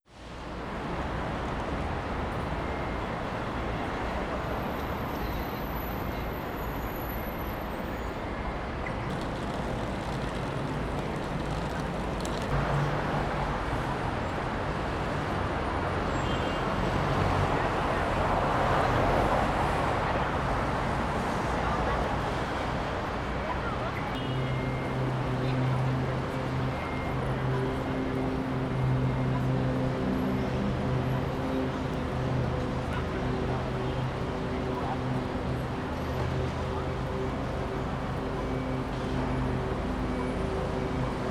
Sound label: city ambient; KriptoFX; WaterSystem